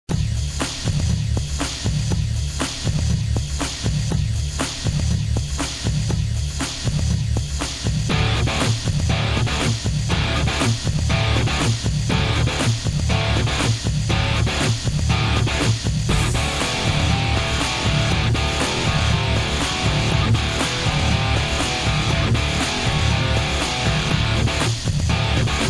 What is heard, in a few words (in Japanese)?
かっこいい、戦闘、ギターリフ; wind thunder god